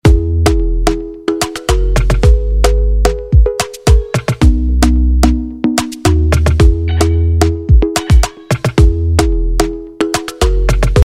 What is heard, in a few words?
jingle